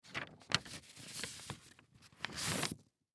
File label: Paper Movement, Paper Fold; Office; Blastwave